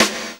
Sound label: SNR, Roland; Real Snrs